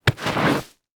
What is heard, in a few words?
Foley Footstep Work Boots Dirt Debris Skid; Foley Footsteps